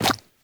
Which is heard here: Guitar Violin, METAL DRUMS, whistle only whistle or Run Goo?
Run Goo